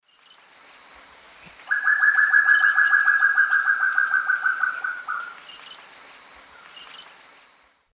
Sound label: Chamaeza nobilis, alarm call; S. America; Eastern Lowlands